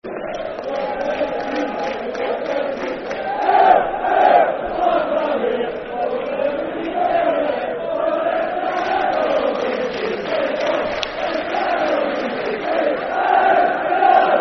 A Grand Ole Team To Play For [Football Chant (Soccer Song)], Dunfermline; Dunfermline Dafc